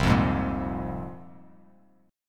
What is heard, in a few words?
C 7th Suspended 4th Sharp 5th, Bass Standard; C7sus4#5 strummed